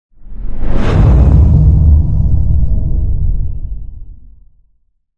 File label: impact underwater or space massive; lukas tvrdon